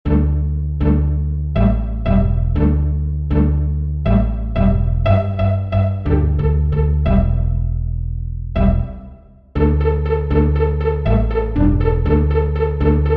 Exercicio Solto

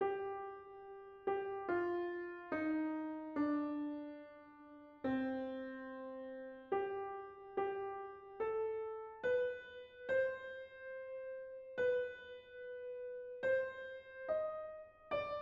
Melodia exercício; Krüger

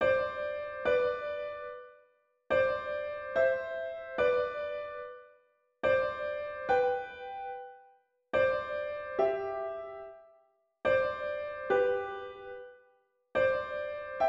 Resolução do acorde subdominante com sexta

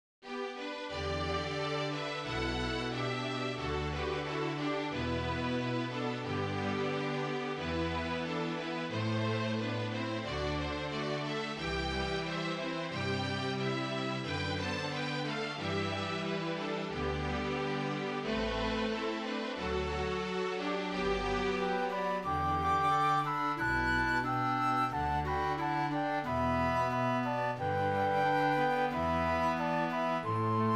cantiga chamber; full score

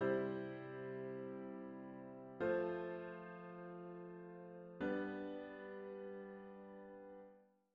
Estudo de Harmonia - acorde de sexta cadência suspenstiva

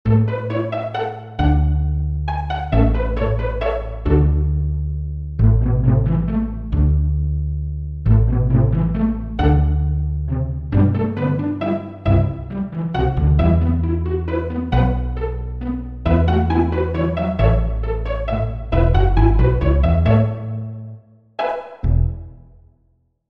2o dedo

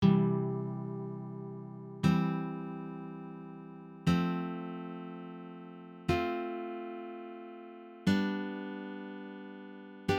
Estudo de Harmonia acorde de sexta

